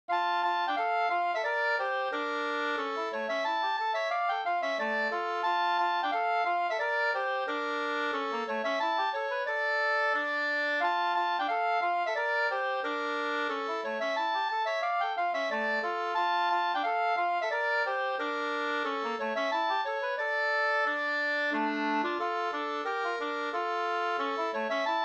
jenkka; Hintikan Matin jenkka fi 2st

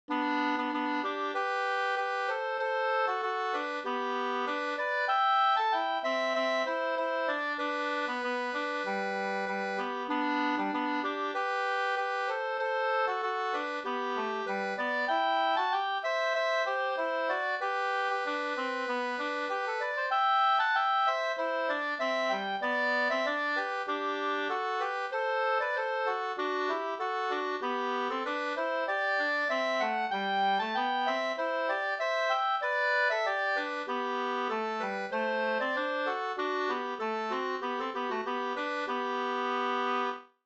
ganglat

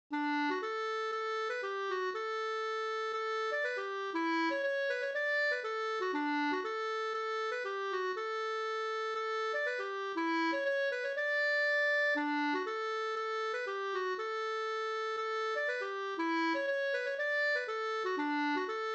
pols Rorospols; Snar å ta åt

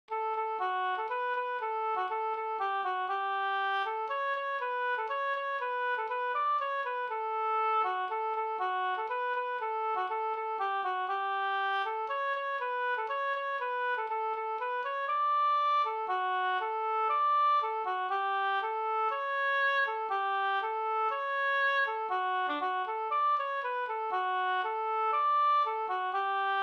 folkdans, Tjeckisk polka Ddur; el Sternpolka

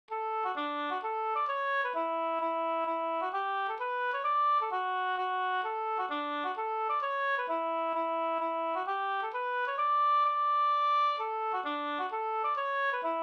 hambopolska

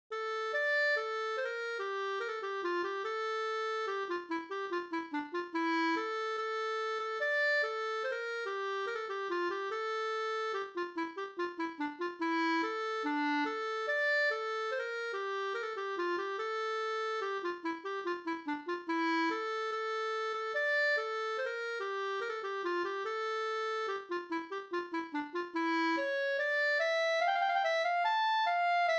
schottis; Allmogel Gavle

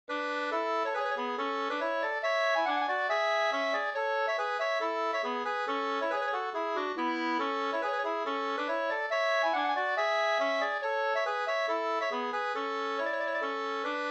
reinlender

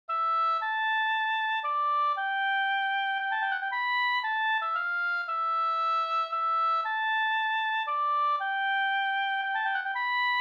polska Bingsjopolska Hornpolskan

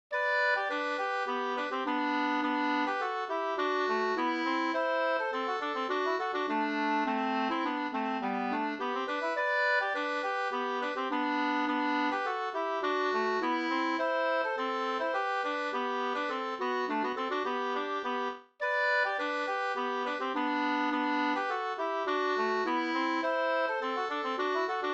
snoa; Orsa

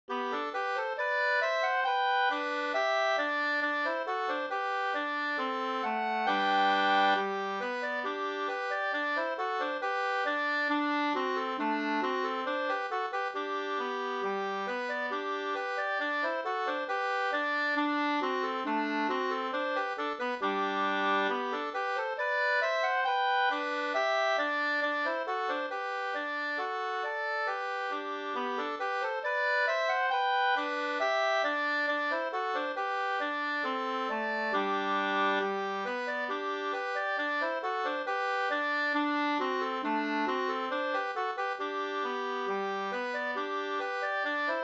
folkdans; Tantoli 2st

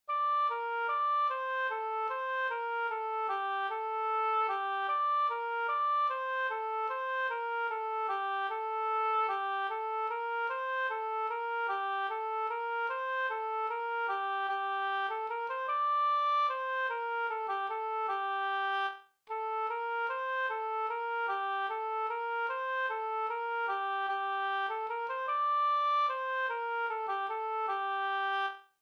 langdans; Lilla vallpiga Afzelius

